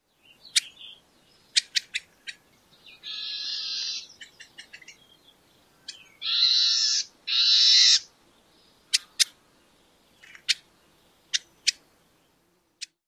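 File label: warbling vireo vit and eeah calls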